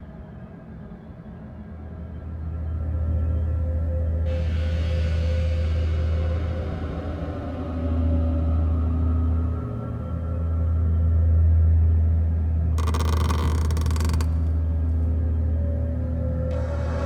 Amb House; Edwards House

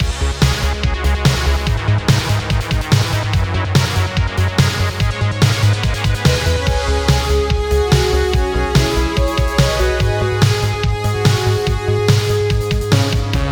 newwave loop; WrestleQuest

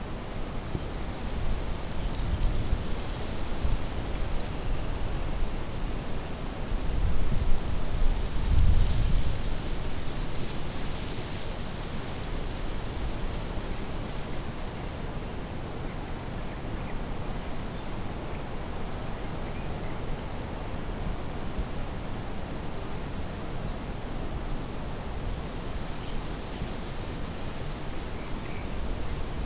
Overworld Ambient Loop; whispering willows

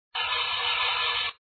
Grind; Tails Nightmare 2

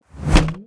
Wheel of fortune Appear; Ui Combat Wheeloffortune Appear

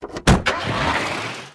Mini Car Out

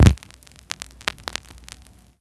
Mus Disco Zombie Needle Start

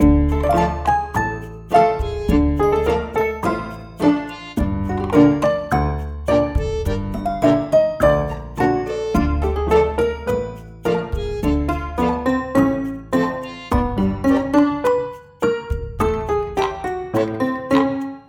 Living room theme; Toy Odyssey The Lost and Found

